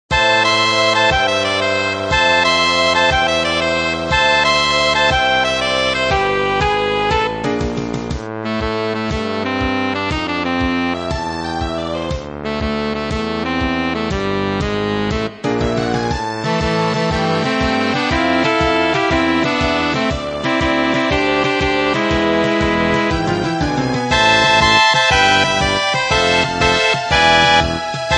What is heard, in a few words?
jazz